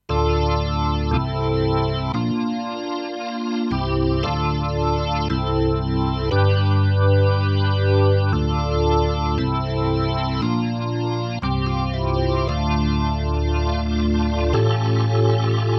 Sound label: Kronos Vintage Organ